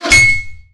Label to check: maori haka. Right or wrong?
Wrong